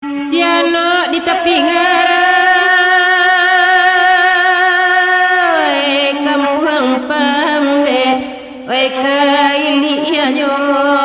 Saluang Ringtone

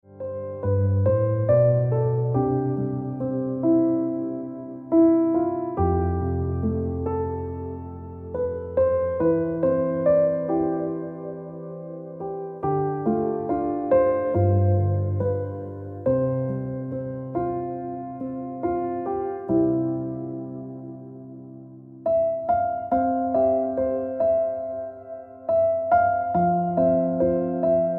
Piano Music

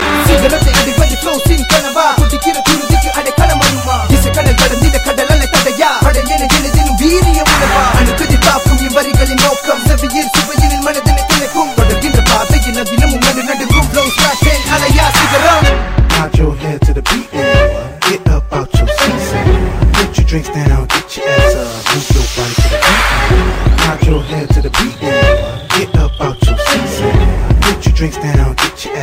Malaysian

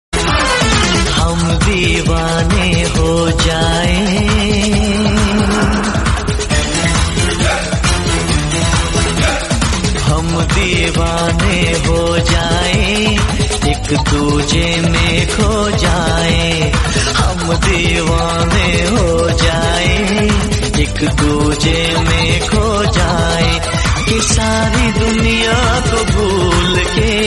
Hindi Ringtone

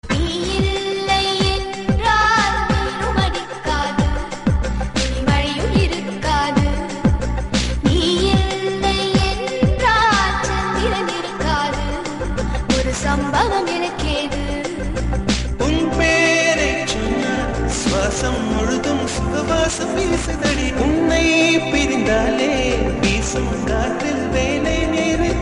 Song Tamil

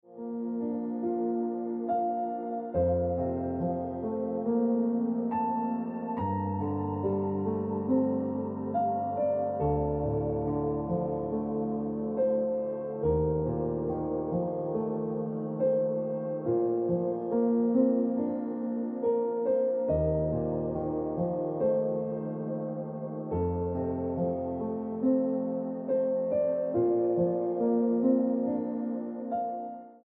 Tonic Note Piano Music

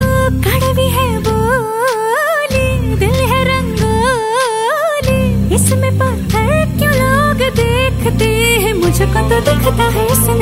Hindi Ringtone; Allu Arjun

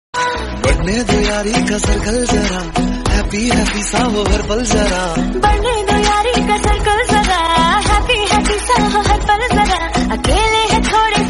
Hindi Ringtone

